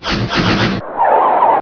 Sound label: hit; Qabalah Trainer